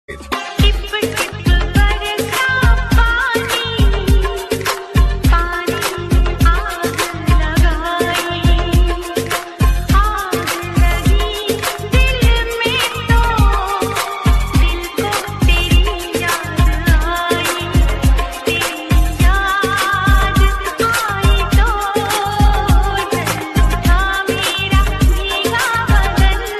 Romantic Old Female Ringtone

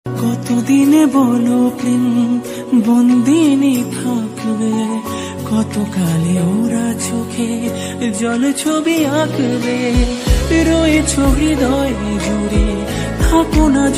Bangla Romantic Sing

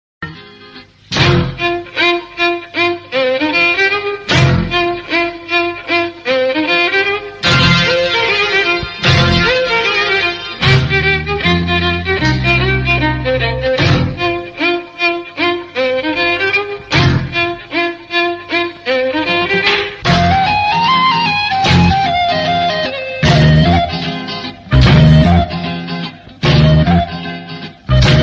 Bgm Ringtone

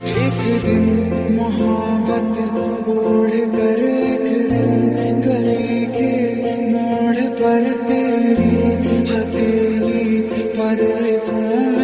Hindi Ringtone; ringtones

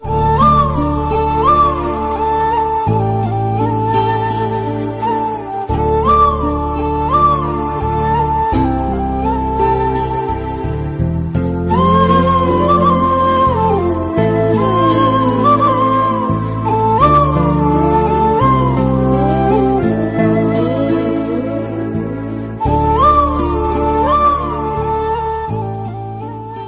Malayalam; ringtones

